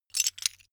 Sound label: metal pieces clatter short